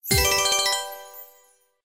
Symbol Regular Win; Premier Night

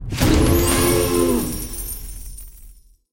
Big win, slots; Aztec Fate

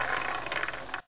decreasing

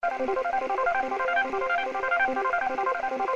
Reel Spin; GalaxyQuest

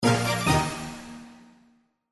Win Symbol Scatter Stacked; slots; Christmas Blast

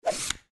Bonus Lucky Pick Reveal; slots; Outdoor America

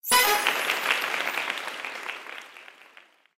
Symbol Jackpot Win; Wealth Gods

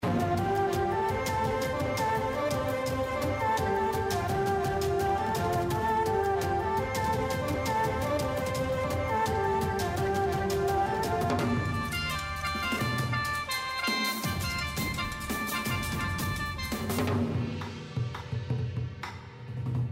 BGM Free Spin, SLOTS; Oriental Ink

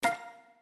btn start, Sicbo

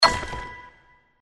regular click; slots; Outdoor America

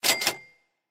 BONUS COLLECT; Zodiac Millionaire

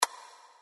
Previous; Halloween Blast

